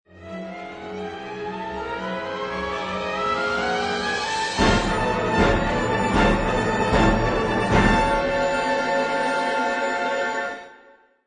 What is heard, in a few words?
Orch Drama, Orchestra plays dramatic passage - variation 4; Orchestral; Musical